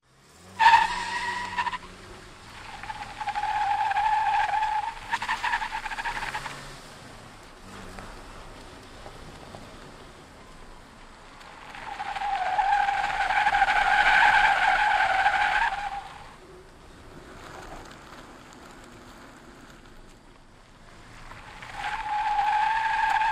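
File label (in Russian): маневры машины с визгой шин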